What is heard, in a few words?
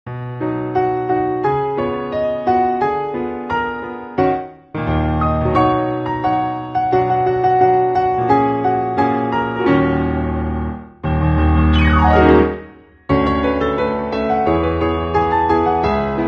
piano